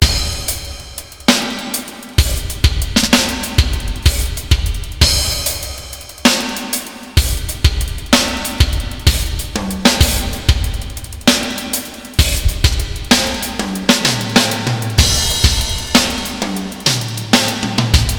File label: verb test